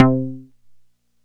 JUNO SEQ, Juno Synths; E-MU Formula 4000 Series Vol. 3; Analog Odyssey

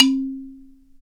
BALA, Balaphone

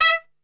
E 3 X PIC SHT, Brass; Best Service; Brass Super Section

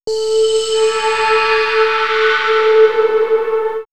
Swirls Metal Breath, pads; X-Static Goldmine

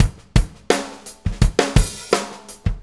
Fonky, Drum Tools, Loops; Sonic Foundry (Sony Creative Software); Loops & Samples Drum Tools

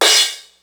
Dance Crash, Ensoniq ASR-X Pro

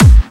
Clubby Kicks, Bassdrums

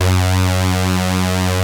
POLY SYNTH; Wizoo - Powered Wave

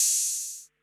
open hat; Polyend; Legowelt

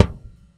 Swinging, Dimensional RL Kicks; Airwindows

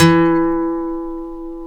F#, funk guitars

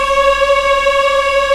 VOX AFMM, VOX Afro Mms, Africa

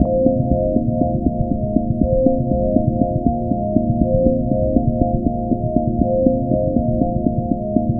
Arpeggio, keys

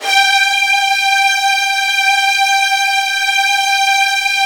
G3, Virtuoso Strings; ILIO; Kirk Hunter Virtuoso Strings VOL-1

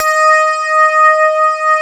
CLAV, FUNK FM CLAV

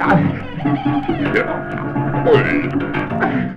Kaos FX, Ambient Grooves

